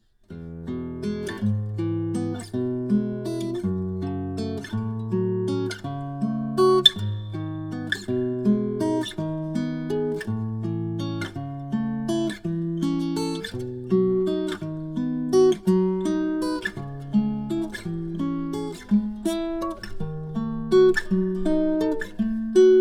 Spread triad, all the related chords in the key of E Major using all inversions for each chord, guitar; Spread triads example 11